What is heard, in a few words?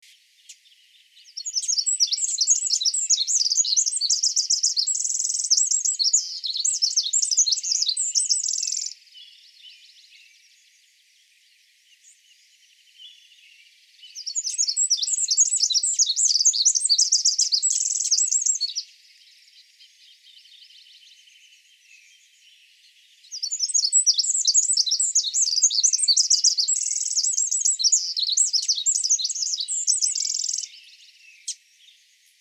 Pacific wren